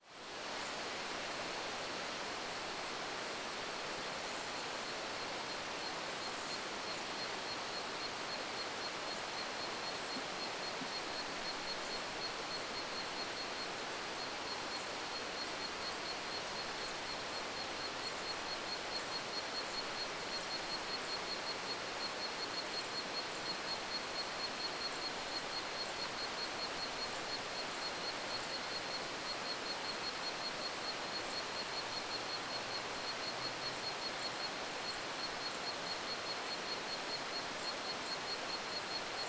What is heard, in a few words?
rainforest